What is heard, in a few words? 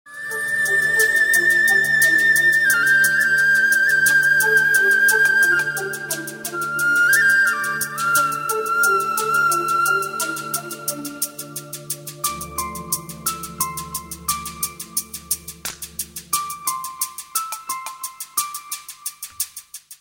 heartfelt lovebeat